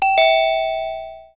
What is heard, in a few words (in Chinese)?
门铃; 日常生活; 特酷声音素材库